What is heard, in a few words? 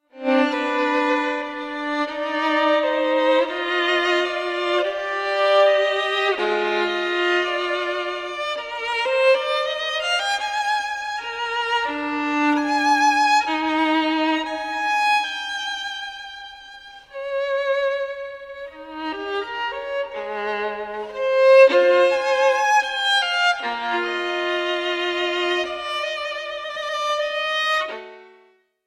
sonata no 1 in g minor bwv 1001 for violin solo adagio